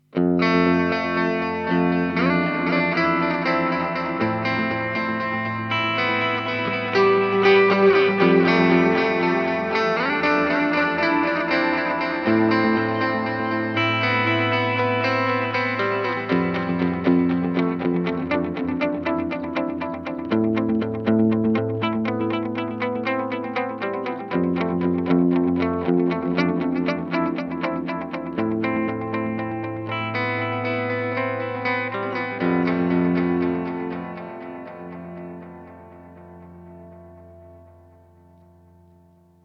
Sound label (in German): Boss SDE-3000D seriell